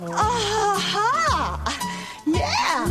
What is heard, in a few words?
ahhahayeah